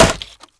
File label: Wood Shield Hit; Civ4 warlords